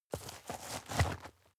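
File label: step dirt heavy boots scuff, footsteps; schritte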